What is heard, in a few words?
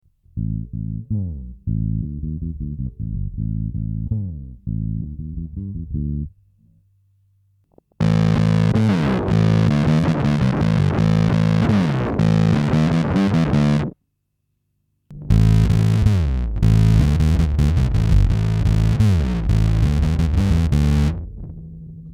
fuzz, Little big Muff; bass big muff; P-bas med flats via effekter rakt in i mixer; Little big Muff verkar poppis.